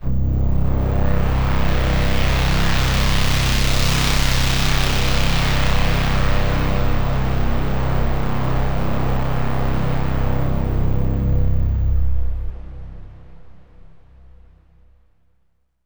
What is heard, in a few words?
Overlay Drone